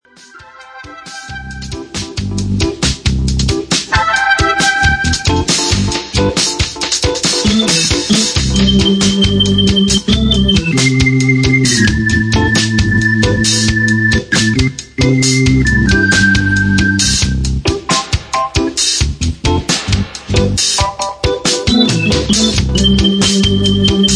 Drum & Organ

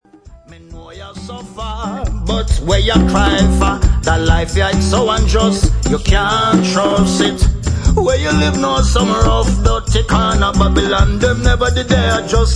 Acoustic

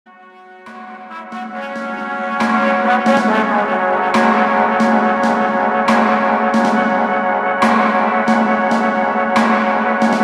Horns Dub

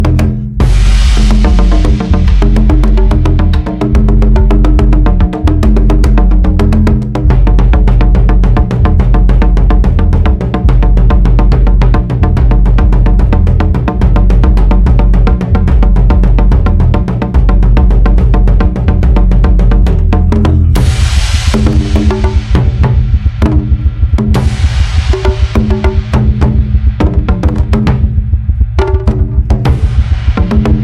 Taiko Dub

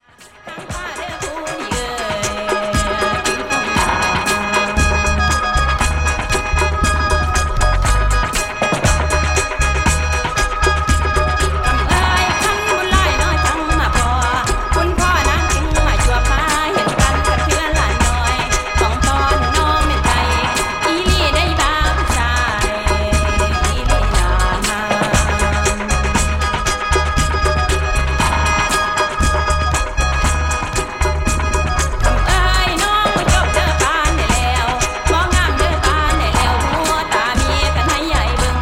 Female Vocal

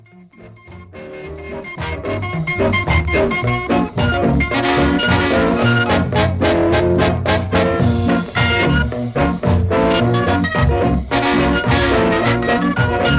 Ska Shuffle

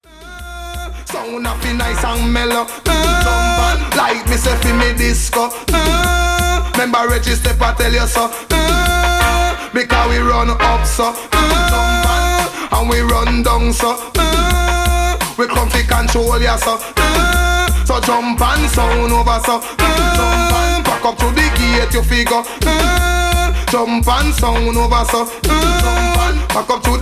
Drum Pan Sound

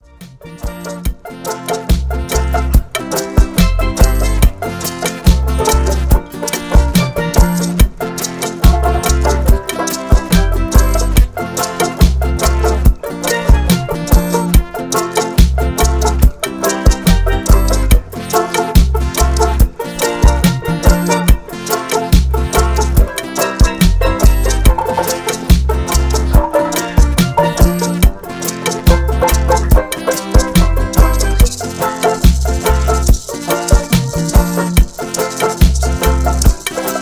Instrumental Mento

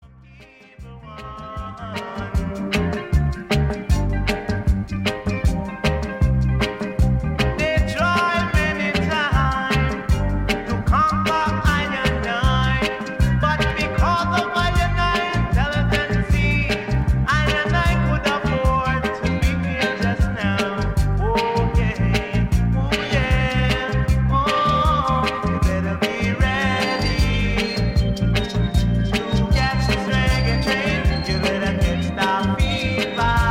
Natural Reggae Beat

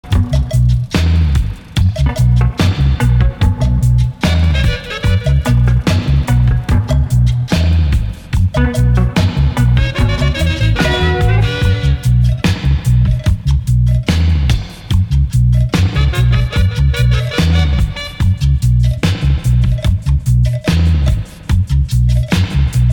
Dub Piano

